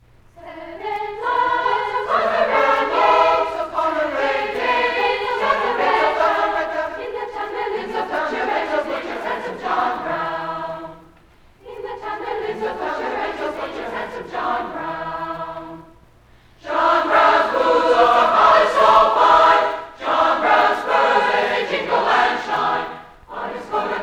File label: Two Hungarian Folk Songs